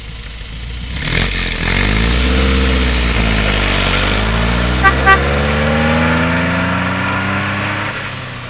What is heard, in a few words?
car drive away with horn